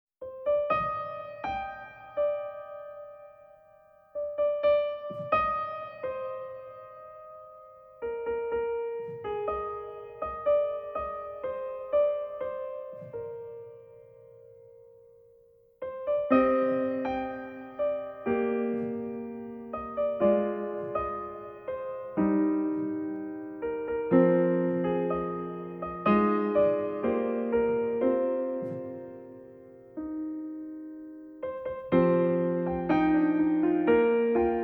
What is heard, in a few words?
Piano Version; Sengoku Basara 3 Original Soundtracks; Sleeping Scarlet Flower